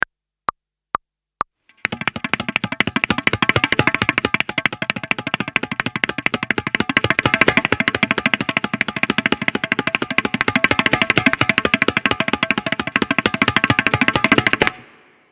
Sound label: Long Roll Practice